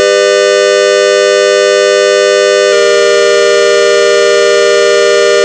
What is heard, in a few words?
pulse, vocoder